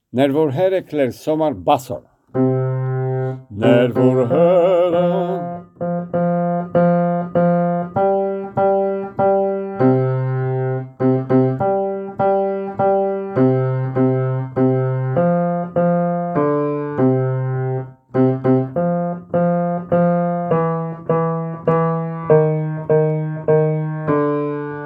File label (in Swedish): bas